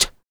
FEM PERC, Vocal Jazz FX

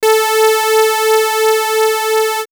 Swirls Brass, pads; X-Static Goldmine

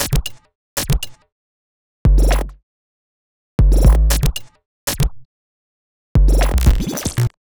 Percussive Bass; Sonic Foundry (Sony Creative Software); Modular Electronica; Basses

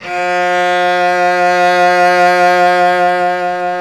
Synclavier Strings; ILIO

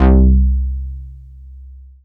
Dance Floor Bass, Multisample Basses

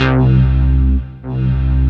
C2, SynthLine; 90 s Sample CDs; NiceBeats; SynthLine VOL-2

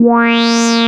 a#, Analogue FX, Dance Synths; Samples vol.37 - Dance Synths

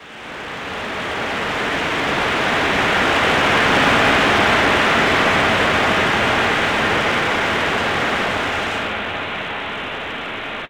SFX, synth atmos & fx; Dream Zone

